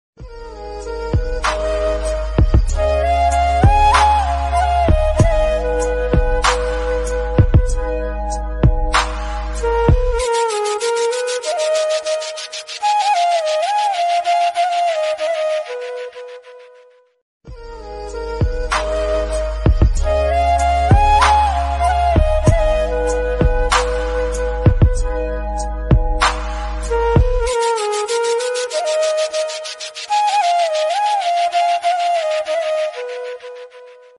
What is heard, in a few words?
Very Emotional Sad Flute Ringtone